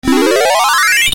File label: Sounds (Tank Teleport); Tron; arcade